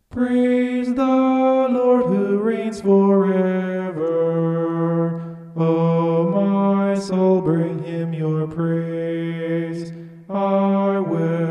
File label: vocal homoph; psalm 146